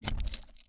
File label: KEY In Wood Door; don t be afraid the first toy